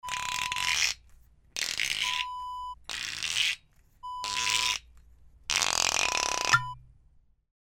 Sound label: cork squeak